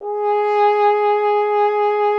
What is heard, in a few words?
F. Horn; Miroslav Vitous; Solo Instruments VOL-2